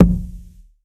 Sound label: Tom Analog; Drums